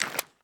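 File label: pistol reload empty fast extended mags end; gmod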